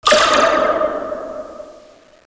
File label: Magic; Total Chaos Nukem 3d Pack; duke